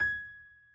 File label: key; keySounds